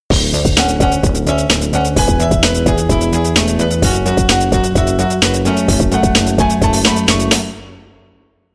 piano

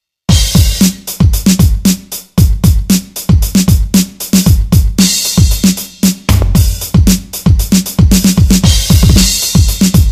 dance drum

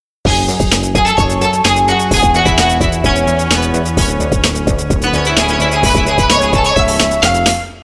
koto

